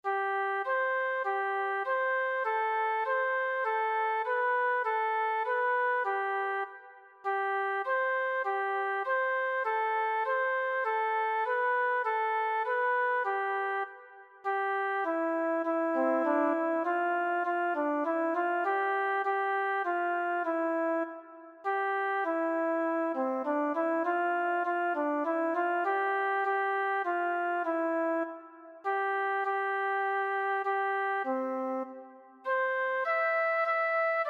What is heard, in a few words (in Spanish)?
canto en forma de canon a tres voces; Las aves entonan; Utilizamos el canto en forma de canon a tres voces para recibirlo con júbilo y arte con todo el grupo de niños de 11-12 a